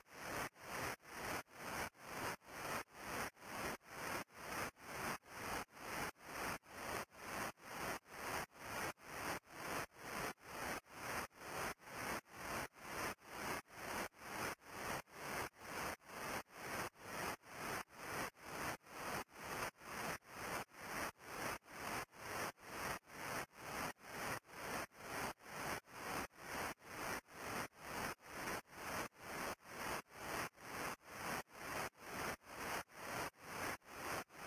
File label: NOISE; rain world